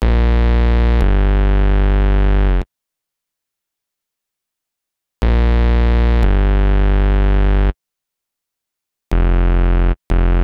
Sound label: Bass, Hip-Hop